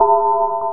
Clock; amiga; DiamChall

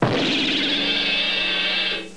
wrong; Professor Fernleaf from the Tropics

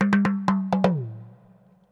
PERCLOOP ETHNO 125; eJay

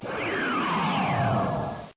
whoo; Rayearth

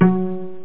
pluck, net instrument; Internet 1996 World Exposition; BrainOpera; net-music

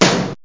snare7 (fx); Octamed 6; walkabout; fx perc

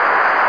noise

